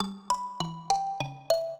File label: minuet, mbira; Mozart